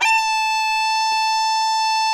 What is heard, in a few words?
POP A, pipes; Grahm's pipes